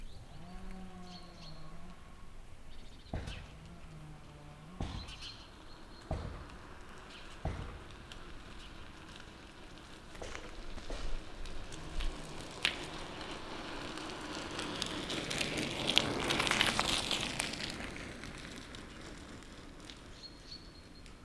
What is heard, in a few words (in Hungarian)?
jobbról balra, motoros fűrész, kerékpár túra; varboc